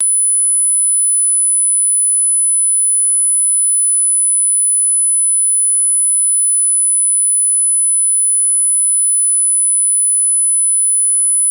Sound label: Tinnitus; Acufeno Pro